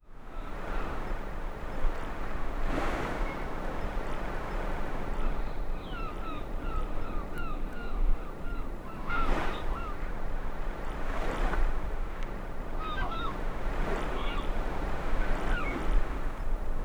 distant waves seagulls, harbor

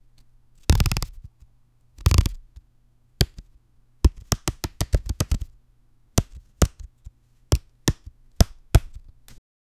picking up a card sound

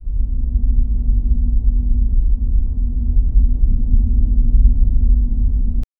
hollow corridor ambience; indoor

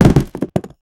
sound of two or three, boxes

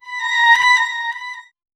stinger suspense tv show

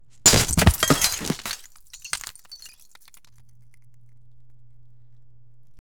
disgusting squelches as w; environment

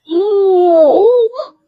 yahoooooo; human vocal

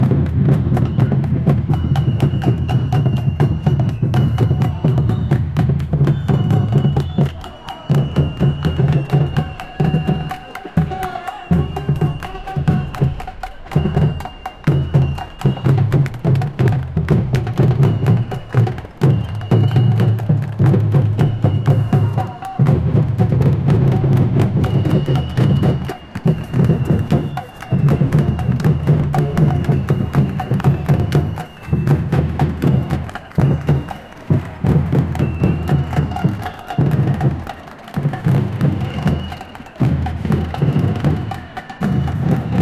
protest war drums native dr; culture

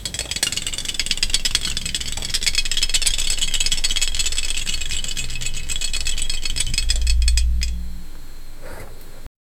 big inflatable ring slowl, pool

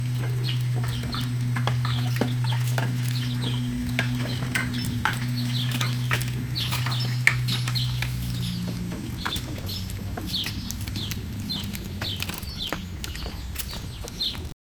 farming house man farming, rural

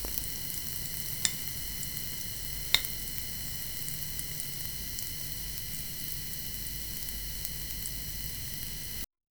soft cooking asmr vegetabl

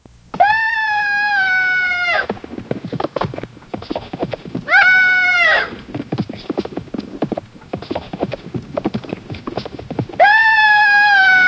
the horses neighed and ho; background

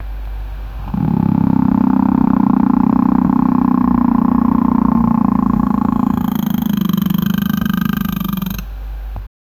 a young baryonyx growling, dinosaur

